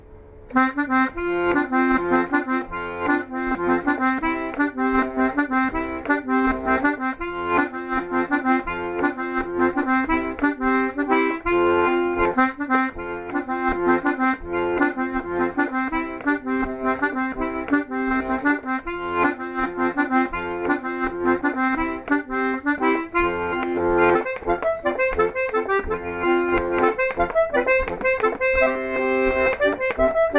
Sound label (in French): air cajun